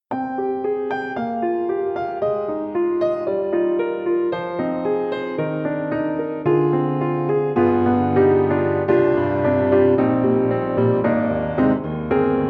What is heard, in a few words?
CONCERT GRAND Close; PEARL; IMPACT SOUNDWORKS; Newman Close